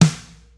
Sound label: subtle reverb snare single hit d sharp key; snare drum samples